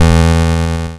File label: NOTE bass E2, LSDJ; LIMAT LSDJ kit; LSDJ Notes; Drums